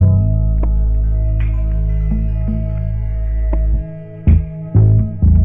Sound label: bass